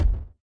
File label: crit, tengu, monsters